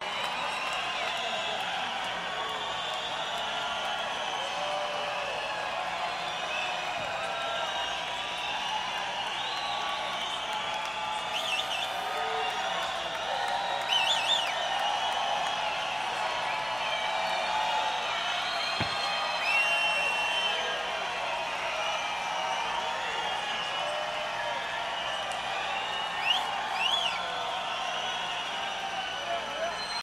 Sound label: Crowd Noise